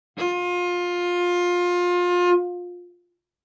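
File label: Cello arco fortissimo sul A F4; MIS Pitches - 2014; Strings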